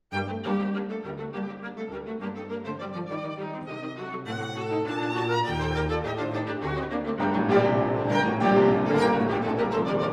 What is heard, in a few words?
Piano Quintet; Gernsheim